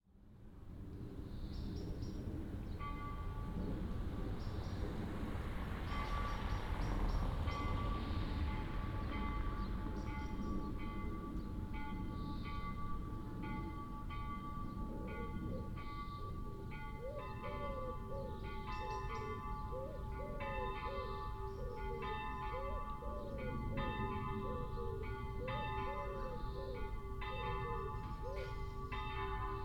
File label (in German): Dom St Peter und Paul Plenum; Bardowick